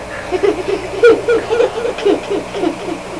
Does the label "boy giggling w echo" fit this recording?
Yes